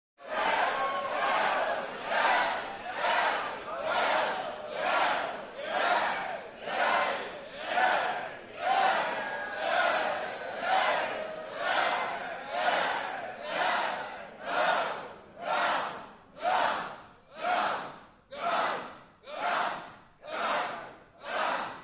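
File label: Crowd 100 Interior Cheer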